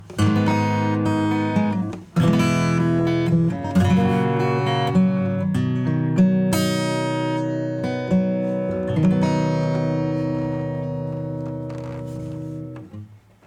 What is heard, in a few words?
acoustic; Xtressor